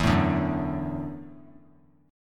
E 7th Suspended 2nd Suspended 4th, down

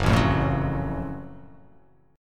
F sharp Minor Major 7th F sharp

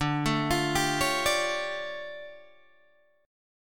D Minor Major 11th, down, Guitar, Open G

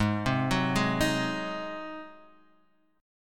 G sharp 7th G sharp down Guitar Standard; G#7; arpeggiated; JGuitar

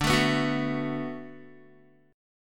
D 6th D down Guitar, chord; D6 strummed; tuning 4F,4A,5C,5G,5C,6E

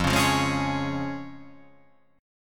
F Minor Sharp 5th; x,8,11,10,8,8,10,10

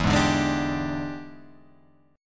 E 7th Sharp 9th, chord

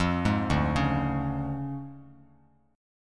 D Minor Major 7th Flat 5th D Bass Standard; DmM7b5 arpeggiated; JGuitar

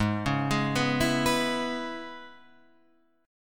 C sharp Minor 7th; Gsharp

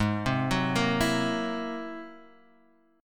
Ab Augmented 9th Ab Guitar Half Step Down

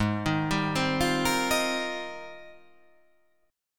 G sharp Major 13th

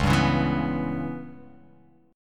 Db Minor 7th Flat 5th Db down, chord; strummed; JGuitar

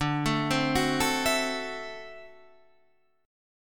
D 9th D down; arpeggiated; JGuitar

